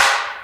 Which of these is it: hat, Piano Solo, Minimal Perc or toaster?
Minimal Perc